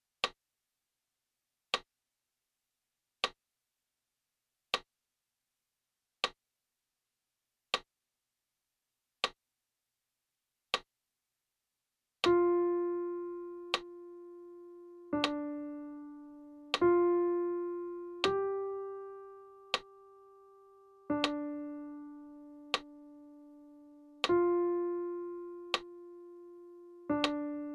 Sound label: Indian Campfires 50bpm